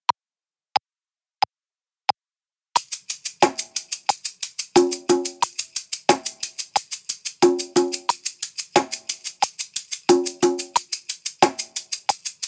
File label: Shaker congas